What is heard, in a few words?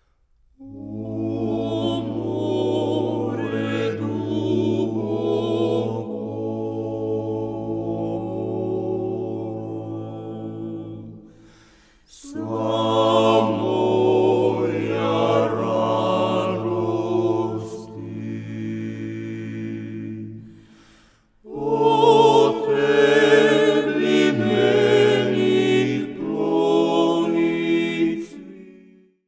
acappella More